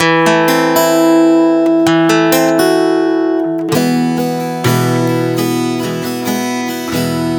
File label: Guitar 130 BPM (A Minor), Acoustic Guitar; Late Call